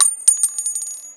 shell; zombiepanic

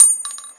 shell; zombiepanic

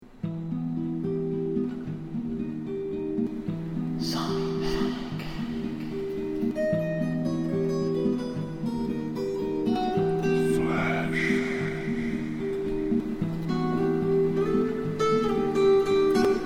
game startup, music; zombiepanic

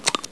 reload, weapons; zombiepanic

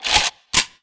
m200k blowback

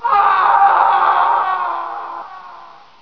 fall scream; zombiepanic

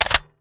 m4a1 clip in, weapons

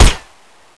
ak47 gold, guns

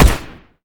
m4a1 gold

